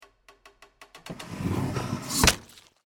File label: Horror, Guillotine, Sequence, Decapitation; Blood and Guts